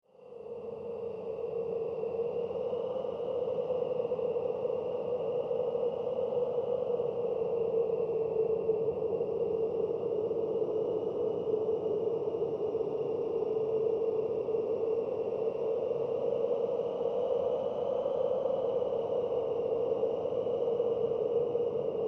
Horror Strange Wind; Scary Soundscapes

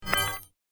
Magic Sword; Sword Sound Effects; fantasy sound effect; Excalibur; role playing games, magic videogames; Andrew Fleming

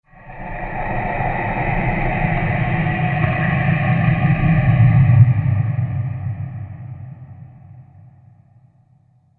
Deep Breathing Horror Transition; Scary Soundscapes; Crafted Signals

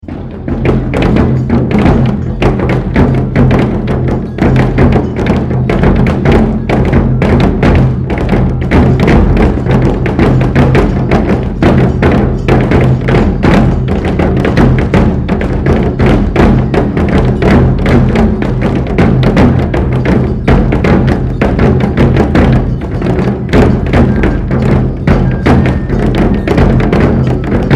Drums Taiko Giant Festival Practise, Aomori Japan; Nebuta Fesitval in Aomori Japan; Musical; Miscellaneous Musical; SoundEffects Foundation2

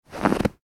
human footstep snow; Single Footstep On Snow

